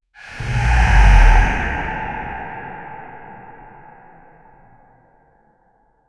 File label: long and unstable wet far, fart